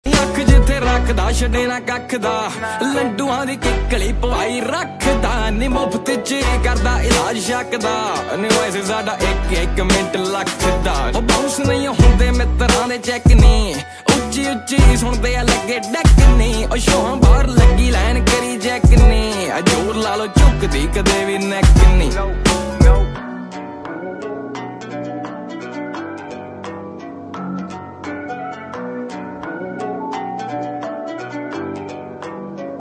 punjabi ringtone

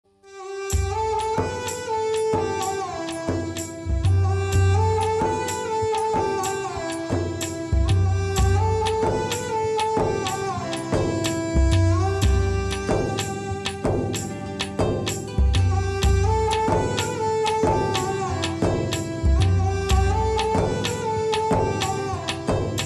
heart touching ringtone best love ringtone

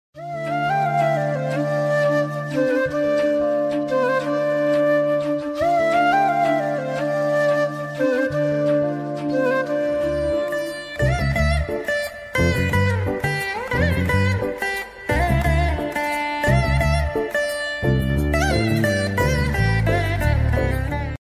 malayalam ringtone original tune no vocal; theevandi

